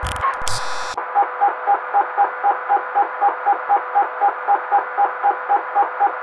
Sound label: manhack machine loop; Half Life Model Pack; Half Life 2; ambient levels canals